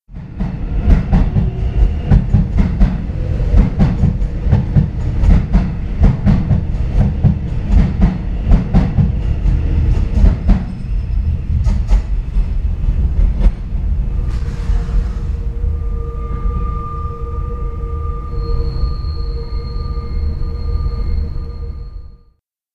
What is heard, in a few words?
merri train; Provenance 2007